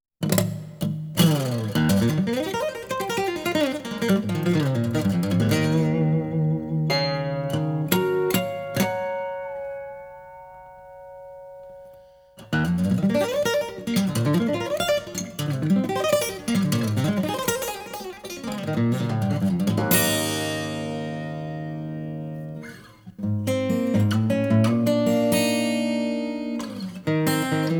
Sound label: Acoustic